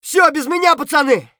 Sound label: hurt near death; Escape from Tarkov; scav3